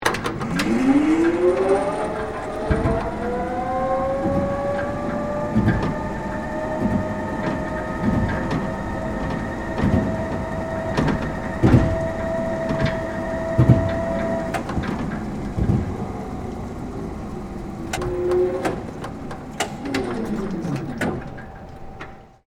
Hi Lo Operate, Industry, Hi, Lo, Operate, On, Board, Perspective; Machinery; Blastwave